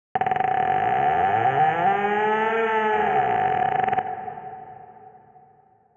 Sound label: door